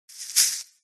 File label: animals bird nest short hard fast single movement hay bushy